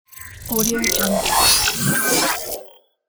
glitch interface

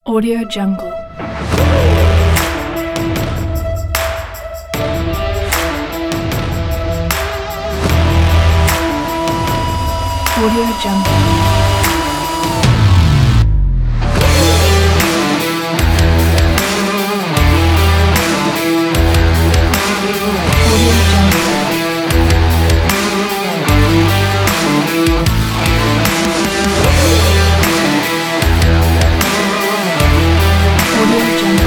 action rock pack

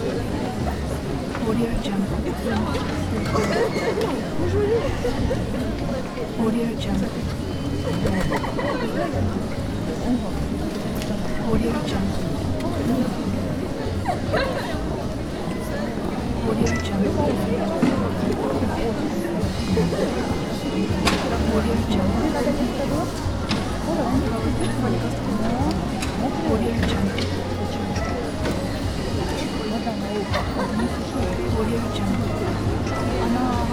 crowd

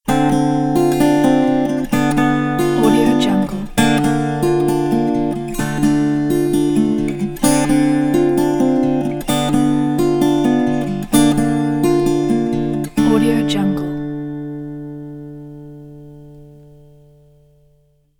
waiting for something logo

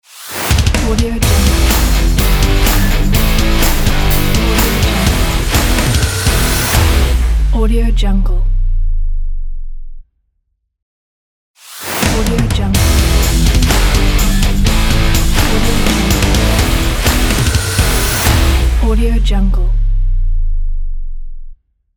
metal logo

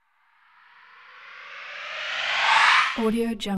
air whoosh; prosoundfx

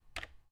keyboard typing

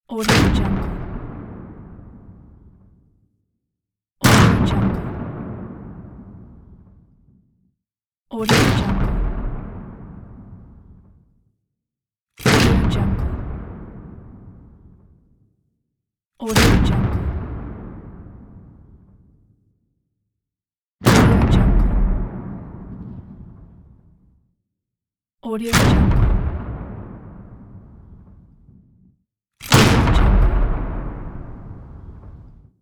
gun shot outdoor

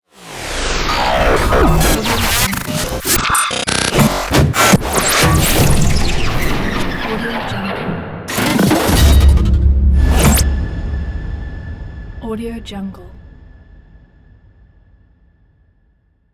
hitech transform logo

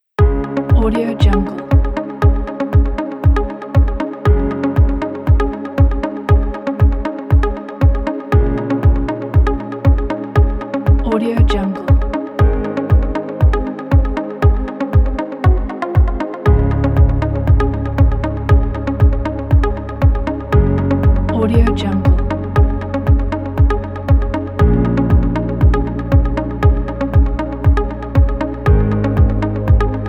corporate ambience inspire

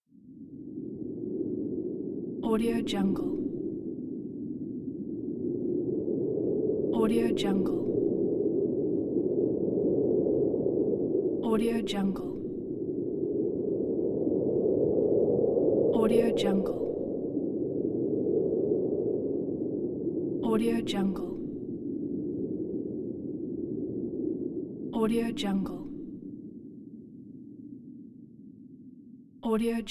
winter wind

